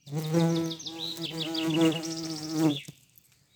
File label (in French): Bourdon jaune se pose; Vols Bourdn abeilles